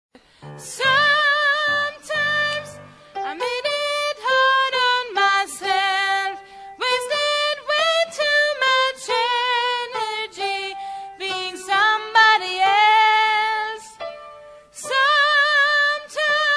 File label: tenor